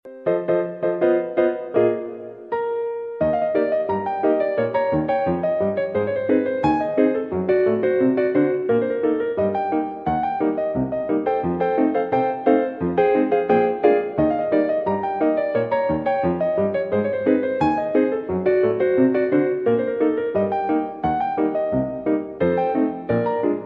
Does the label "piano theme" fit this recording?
Yes